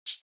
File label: Shaker